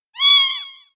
felid

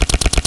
draw

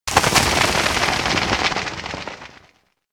fly

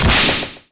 mace

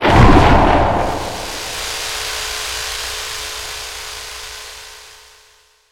salt

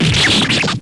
sword

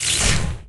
electric

